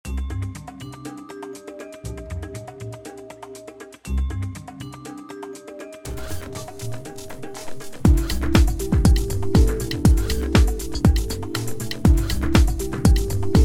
cuban groove

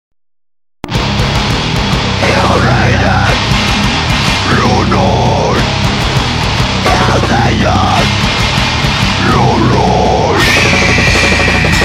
disco disco

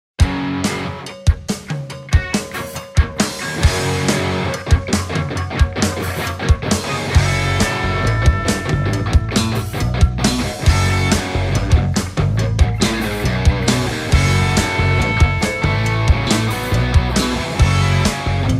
preludium g dur

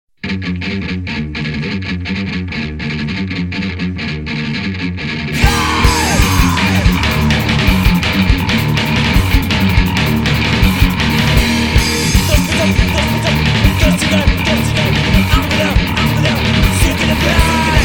techno

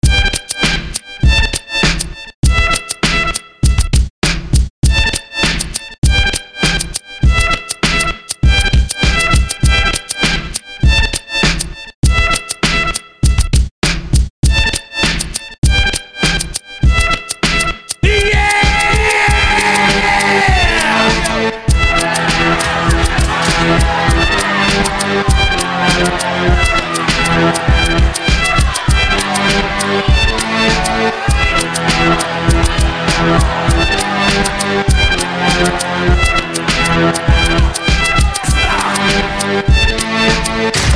angry crowd sample

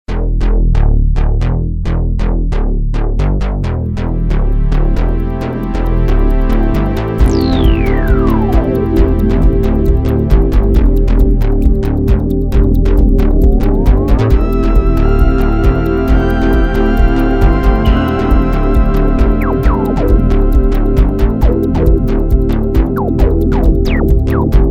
korg dw 8000 test

